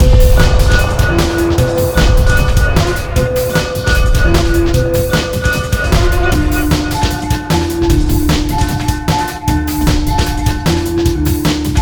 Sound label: Fight